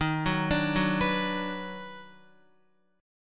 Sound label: D sharp Minor Major 7th Sharp 5th D sharp down Guitar; arpeggiated; chord